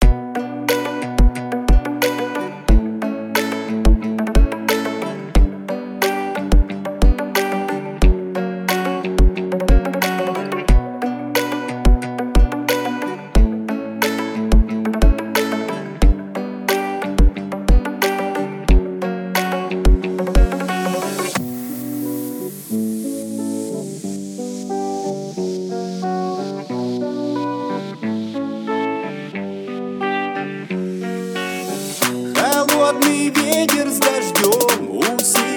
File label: 33-37hz Remix Low bass